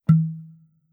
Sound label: Monnlight Pop; Legend of Keepers (gamerip) (2019); Monnlight Pop2